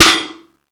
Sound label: piercing acoustic snare sample e key